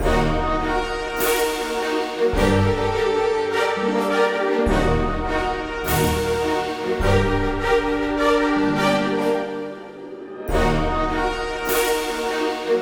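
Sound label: Loop A minor 103; Disney Infinity; Top Platform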